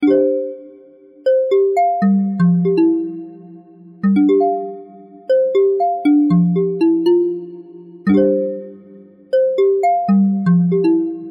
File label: Crystal Tone; HMD; Pulse